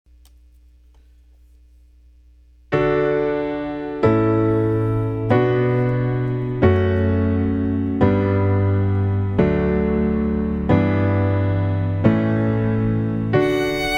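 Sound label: Canon Piano And Violin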